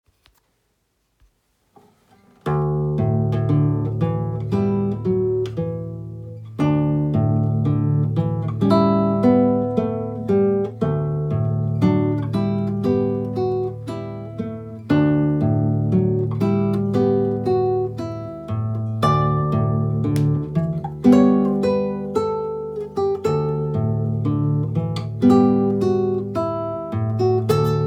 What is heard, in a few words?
Bell Towers, drop d tuning